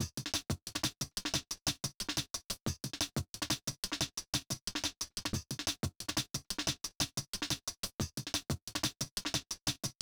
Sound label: Breakbeat A 8 Bars; Vostok Inc.